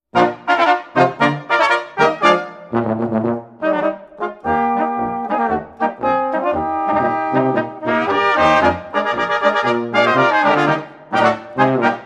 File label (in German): Sonnenblumenpolka; volksmusik